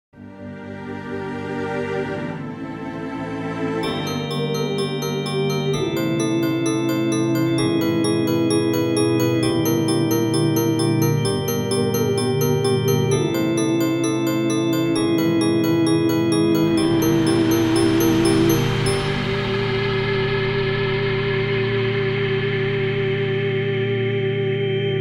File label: halloween music very short